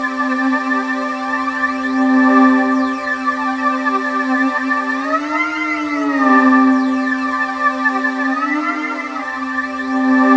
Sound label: C4, Synth Leads